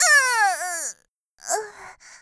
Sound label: die; Eternal Return; Tia; Voice Line; KOR